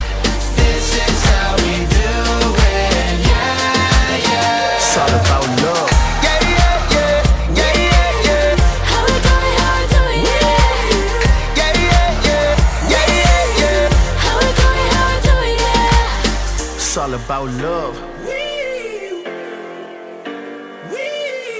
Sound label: bollywood singer ringtone